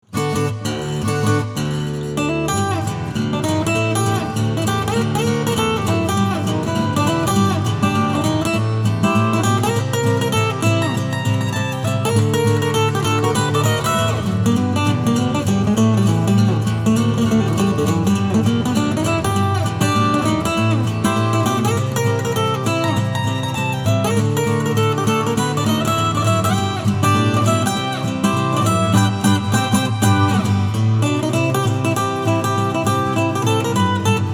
Acoustic

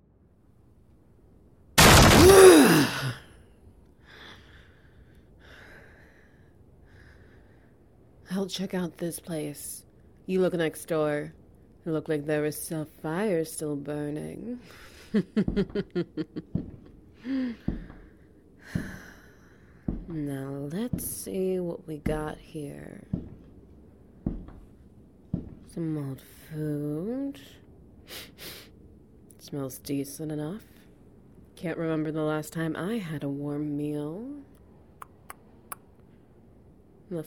Ambience SFX Wet Noises

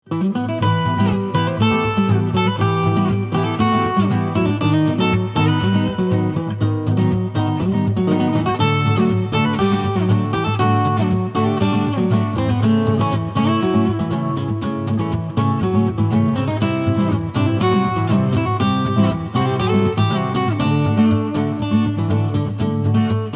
acoustic

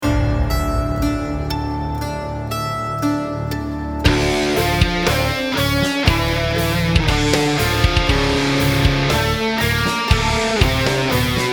fugue subject

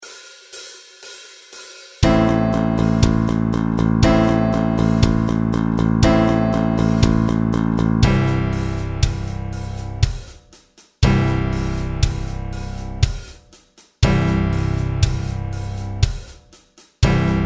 Backing Track, One Take, Original Tempo

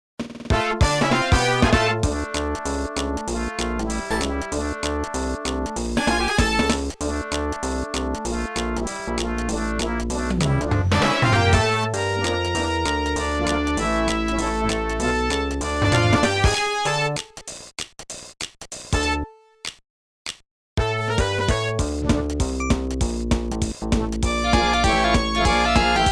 Sega Genesis Instrumental; SupremeMIDI

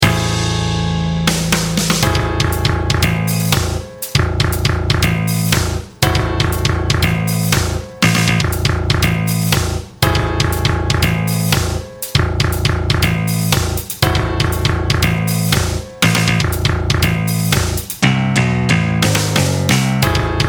Guitar Solo Backing Track

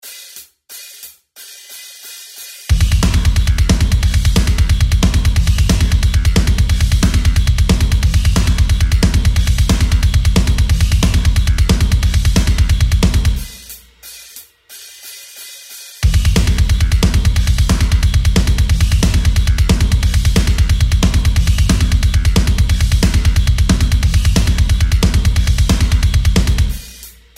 E Hungarian Minor BACKING TRACK

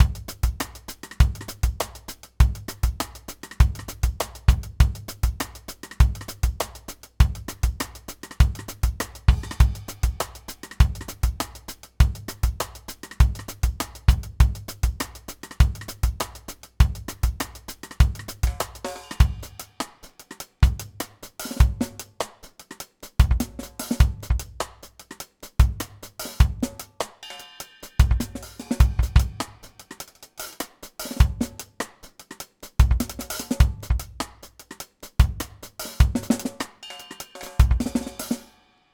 drum only 100bpm; gruvz

